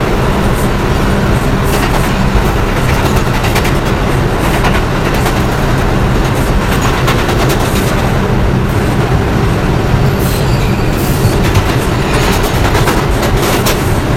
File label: Run, R142; Jerome Yard; OpenBVE; Train